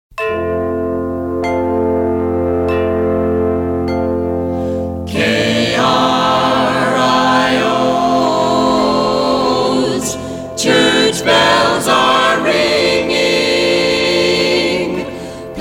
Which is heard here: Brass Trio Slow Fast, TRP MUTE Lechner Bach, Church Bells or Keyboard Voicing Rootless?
Church Bells